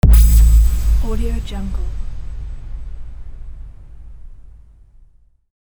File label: impulsive boom; soundmasterfx